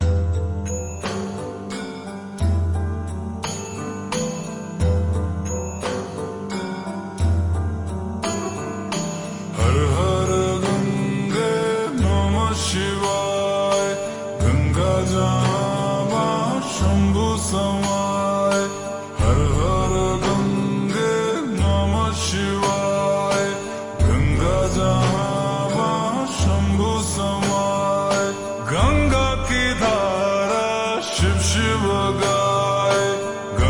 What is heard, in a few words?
Slowed Reverb Ringtone